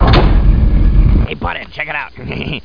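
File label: door move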